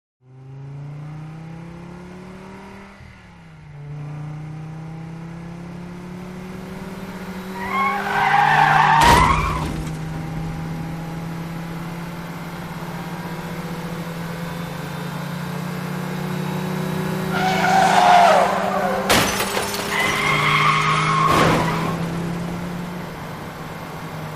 Scene Two Vehicles Driving At High Speed While Attempting To Broadside Each Other Off Road, Losing Vehicle Knocked Off Road Into Water